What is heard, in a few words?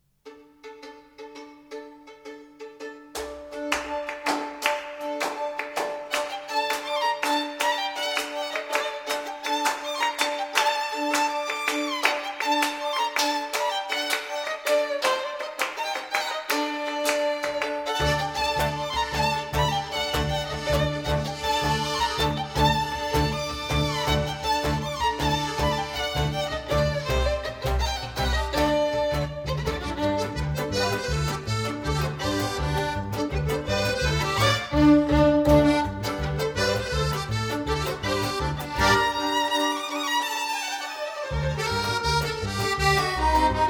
Klezmer